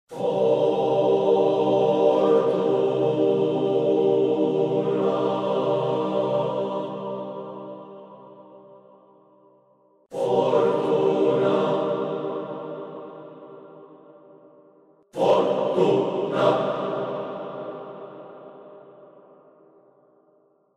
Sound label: slow fast staccato; fortuna